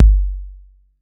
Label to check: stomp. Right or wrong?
Wrong